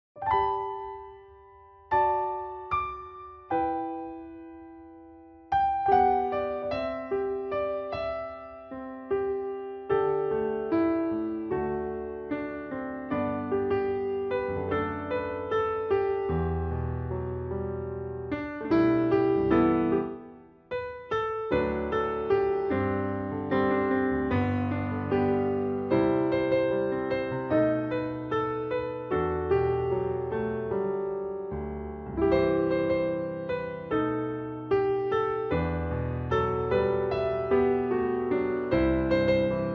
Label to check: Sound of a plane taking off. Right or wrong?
Wrong